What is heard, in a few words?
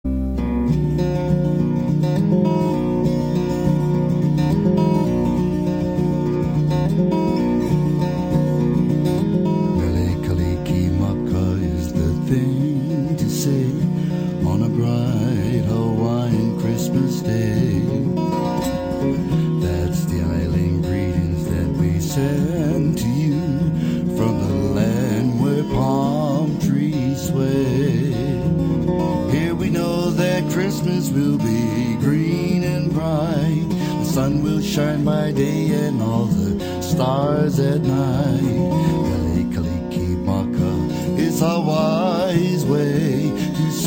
Hawaiian Slack Key Guitar